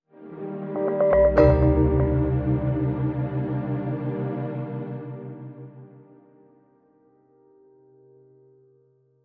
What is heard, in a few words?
Sound Logo